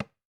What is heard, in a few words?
TAM TAM, African Drums; E-MU Formula 4000 Series Vol. 4; Earth Tones